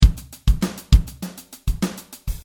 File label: 16th note drum groove